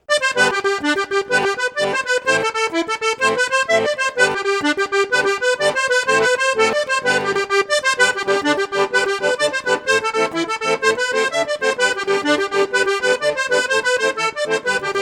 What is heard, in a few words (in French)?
registre flute juste flute haute piccolo, accordeon; cavagnolo virtuose 4